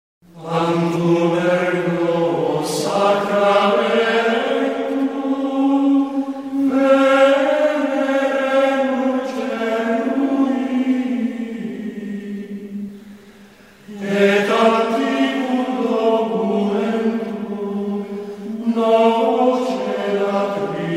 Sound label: TANTUM ERGO CORO; GREGORIANO